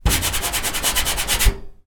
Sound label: foley fingers rub metal gas fire hood